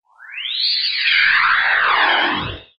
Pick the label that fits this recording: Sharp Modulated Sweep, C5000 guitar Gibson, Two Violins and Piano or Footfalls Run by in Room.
Sharp Modulated Sweep